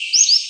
song

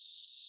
song, Empidonax difficilis; Passeriformes; Tyrannidae

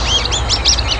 rare calls, Empidonax traillii; Passeriformes; Tyrannidae

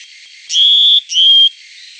song fee bee, Paridae, Poecile atricapillus; Passeriformes

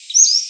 song

